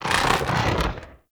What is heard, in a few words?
Head Pull; Warframe; Teralyst; Ropalolyst; Flight